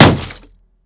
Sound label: shield light block small